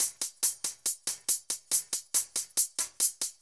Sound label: drum Loop 140BPM HH